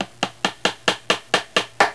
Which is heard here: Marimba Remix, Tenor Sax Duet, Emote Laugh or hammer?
hammer